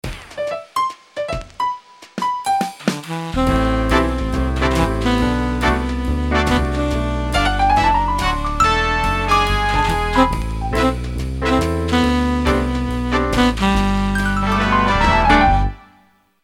Cherry Tone JAZZ, notifications; Vertex Impress Drive

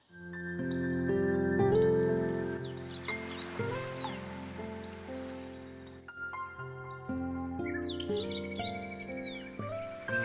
Seaside, alarms; Coolpad Dazen X7

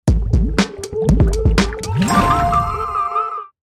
Power On; Play; Poland

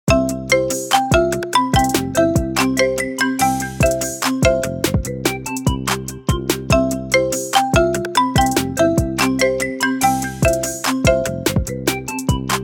We Can Marimba; Tecno Spark 40C; alarms

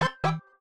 Ducky, notifications; Samsung Galaxy S25 Ultra; Fun

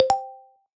Blink, notifications; Yandex Phone

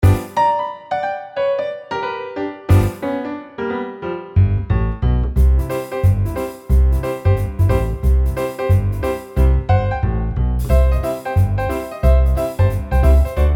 Jazz; TP-LINK; Neffos C5

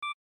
self timer 10 sec, ui; Sony Xperia 10 III XQ-BT52

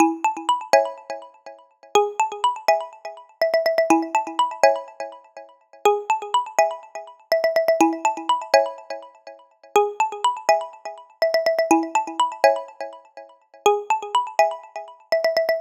Slow Beat, ringtones; Ai+; Pulse

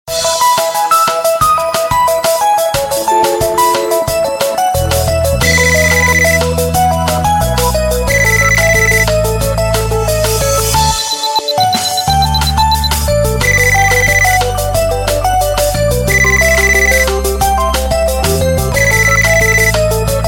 Happy Call, Ringtones; SU760 Optimus 3D; LG

